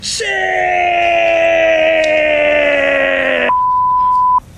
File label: shiiiiiiiiiiiiiiiii